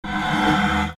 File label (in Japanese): isu; easyrpg; Ib